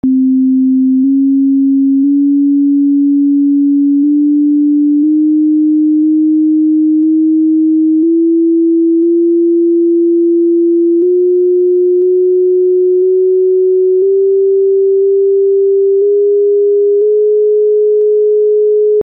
Soprani+Alti+Tenori range 3 mixed ascendant scales 157+138+126 cents; 19 pitch